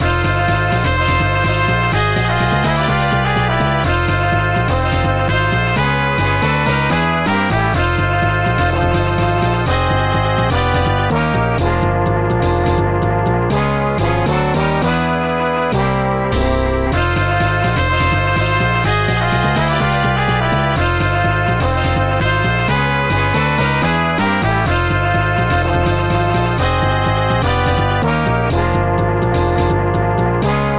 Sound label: Jackpot, pinball; Pinball Mania; amiga